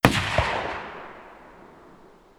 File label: ssg08 fire dist; vj weapons